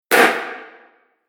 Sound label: Epic Crash Bang, impact; Miscellaneous Impact Sound Effects; Impact / Explosion Sound Effect